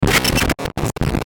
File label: DIGITAL BLURP; GLITCHES; Blastwave